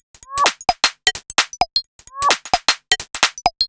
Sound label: Hollow 130bpm, House Drumloops; Zero-G House Fabrik Drumloops; ZG Drumloops